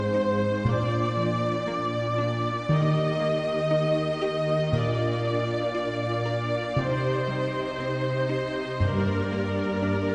classical track ringtone

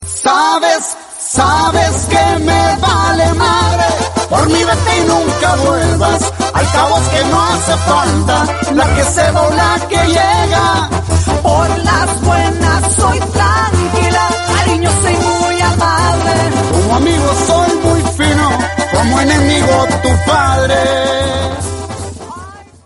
mexico love ringtone

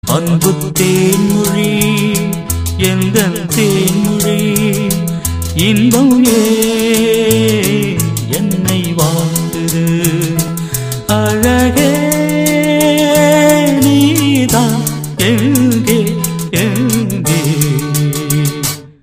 tamil tune ringtone

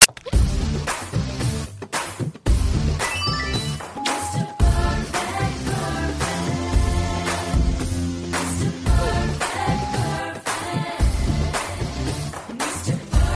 the kiss beat ringtone

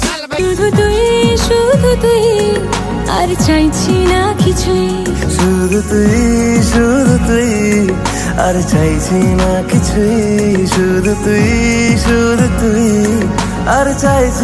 bengali ringtone

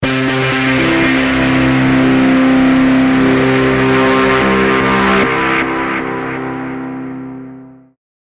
heavy reverse ringtone

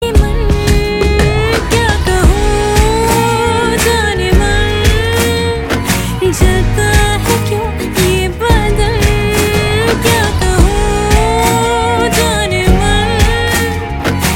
hindi ringtone

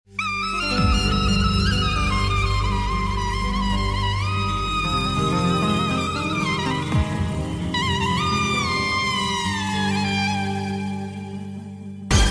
egypt feat pakistani ringtone

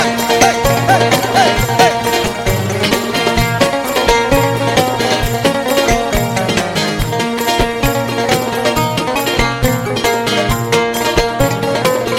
arabian oud party ringtone

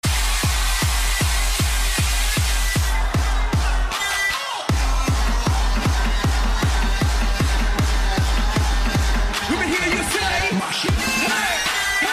trance hot ringtone

